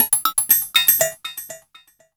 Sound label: Industrial Loops; 119 YORK